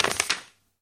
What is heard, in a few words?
book